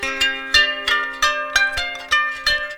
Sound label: Valiha loop